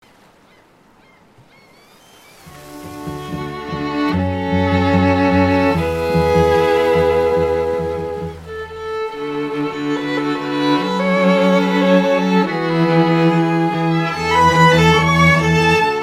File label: strings